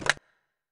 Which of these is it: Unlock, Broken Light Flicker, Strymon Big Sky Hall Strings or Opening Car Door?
Unlock